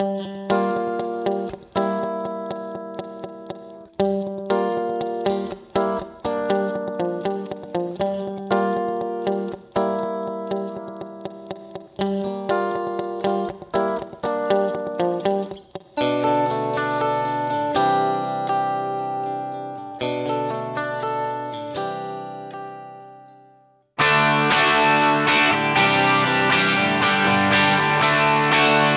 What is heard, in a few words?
Guitar Yamaha Rev7 ER2 Mode 3 Vintage Digital